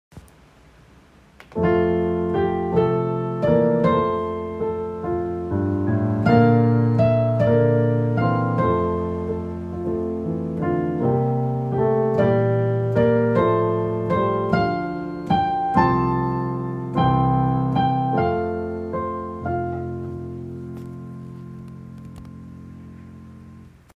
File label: Sword Ferns